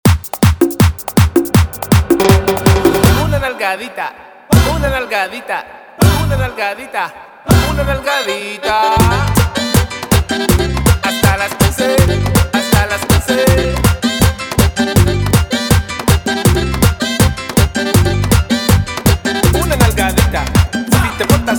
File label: 161 bpm